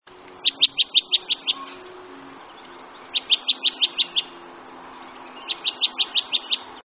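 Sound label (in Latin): junco hyemalis; junko - śpiew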